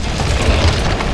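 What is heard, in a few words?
catapult move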